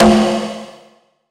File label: Big Conga